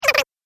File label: Gremlin Chatter, monster sound effect; Horror Sound Effect